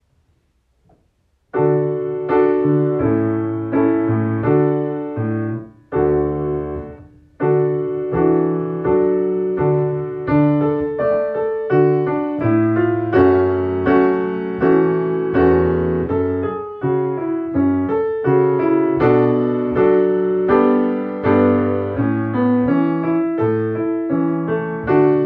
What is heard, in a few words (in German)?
Klavier; Saiten wechseln